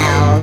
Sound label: Synth Voc; Vengeance Samples; VEE Synth Shots